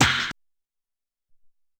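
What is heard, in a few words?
Hop Snare, Alesis SR-18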